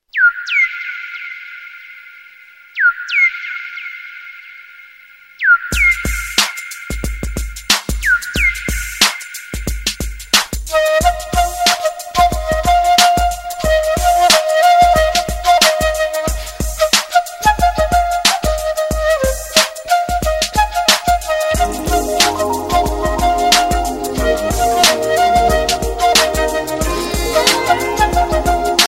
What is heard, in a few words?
ringtone, nokia